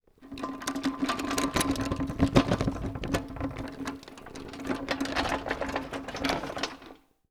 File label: Metal Trolly; 99Sounds; The Warehouse